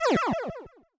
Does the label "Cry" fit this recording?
Yes